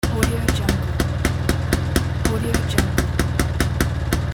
old motorcycle engine idling loop

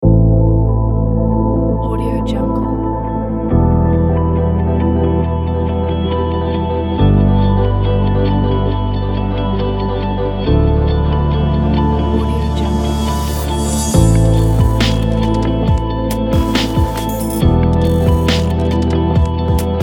experimental abstract pack

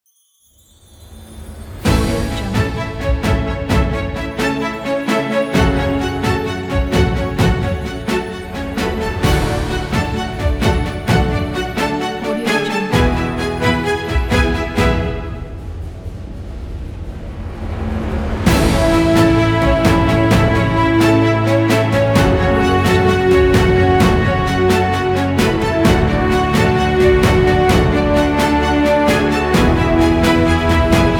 award opener kit; musicaura

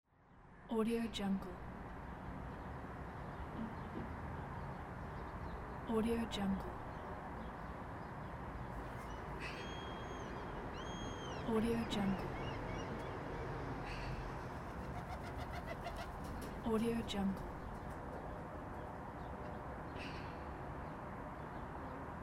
city with birds

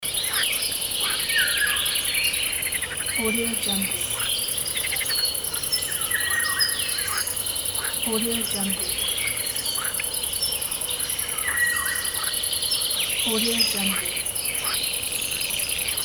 jungle

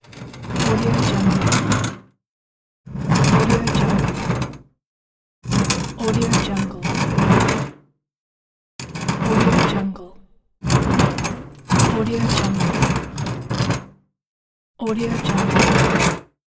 metal concrete slides

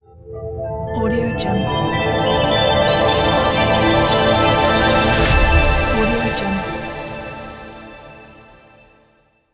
abstract logo

